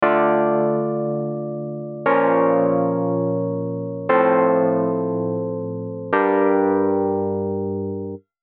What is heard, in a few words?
wurlitzer